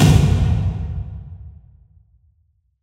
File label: Epic Drum